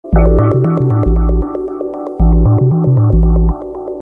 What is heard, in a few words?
melody